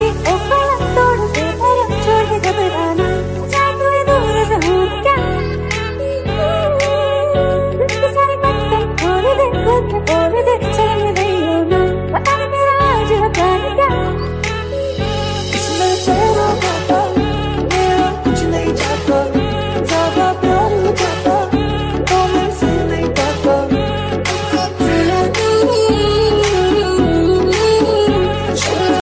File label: hindi remix english ringtone; samsung